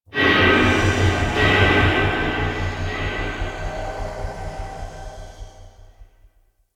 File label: Intrusive Echo; Warframe; Ambience; Entrati